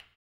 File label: HAMBONE; ILIO; Vocal Planet VOL-2; Blues & Soul